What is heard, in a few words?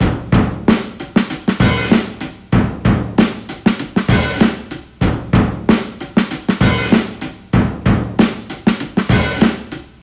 96 bpm drum groove d sharp key, Free drum loop - kick tuned to the D# note.